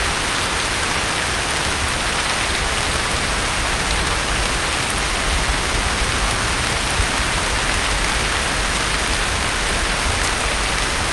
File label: rain hard loop; Sonniss GDC